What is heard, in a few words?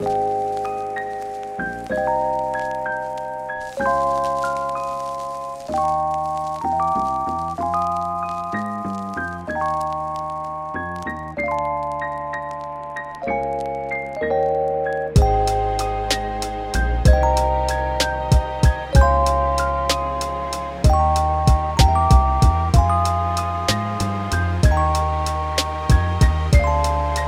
hip hop beats